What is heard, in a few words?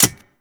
Spear Reload; Weapons; Warframe; Tenno; Priest Spear